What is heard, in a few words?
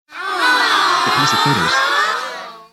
hoooooo